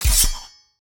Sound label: Kill